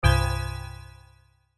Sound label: Bells